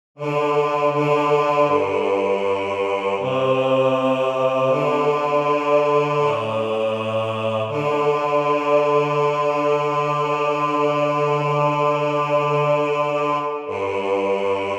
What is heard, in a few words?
bass